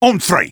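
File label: Sniper taunt rock paper scissors exert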